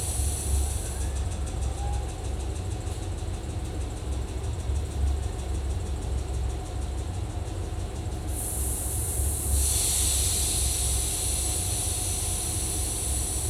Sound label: Ambience generator